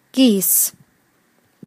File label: Geese